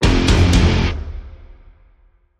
Stab Guitar Triple (F)

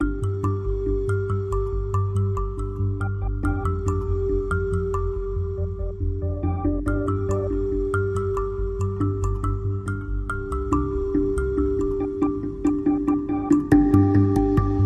Life Travels No Drums; 30sec

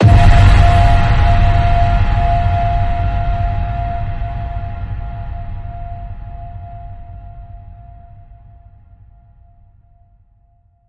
Ping Pong, F

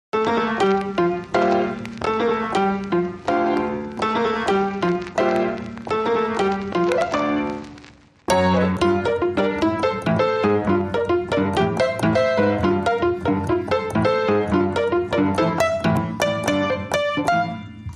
Piano Only

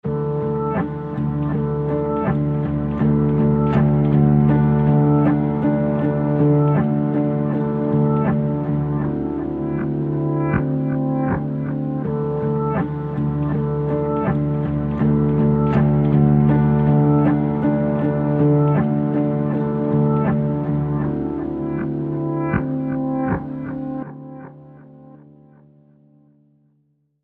Low Keys Pulse

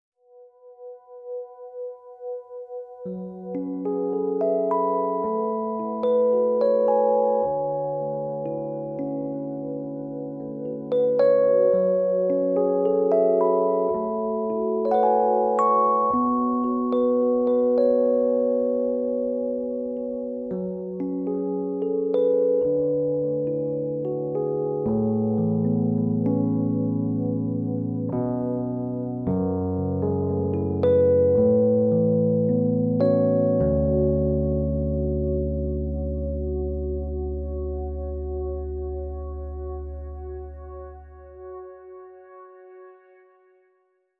Rhodes

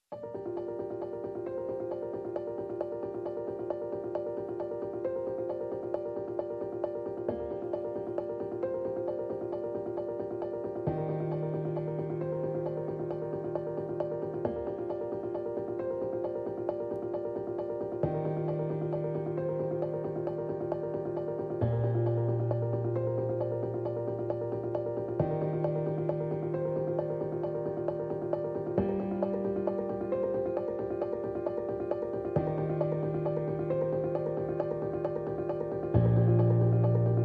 Piano Only

